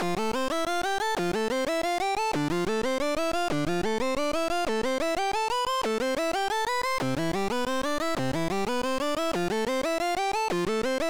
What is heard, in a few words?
Poly Arp